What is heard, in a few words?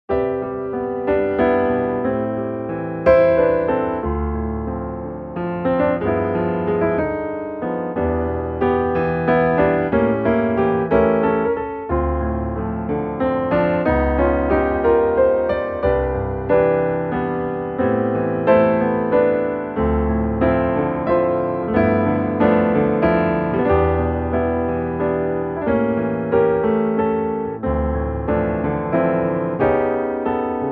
Cocktail Piano Beauty 121